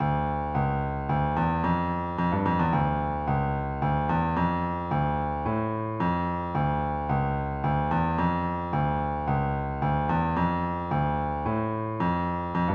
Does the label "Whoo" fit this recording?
No